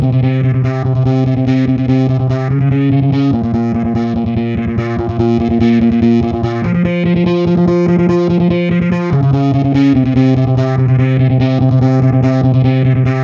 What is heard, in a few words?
distorted, 145BPM